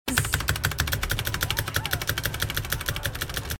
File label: keyboard; glitteralerts